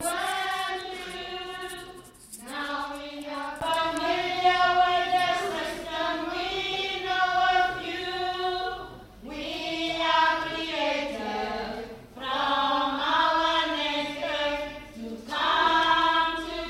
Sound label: women asylum seekers together choir; at rcoconf 2012